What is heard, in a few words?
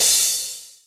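short cymbal; osu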